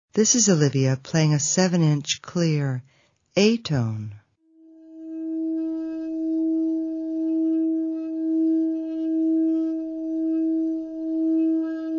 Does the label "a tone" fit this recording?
Yes